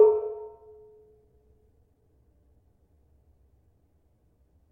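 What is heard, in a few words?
Barung A4, gravity harps tuned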